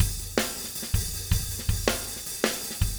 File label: 160 Nustyle Drums Verse, Very Fast Tempo; Pop Rock; Pro Tools Essential Loops; Digidesign